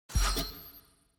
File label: environment mob level up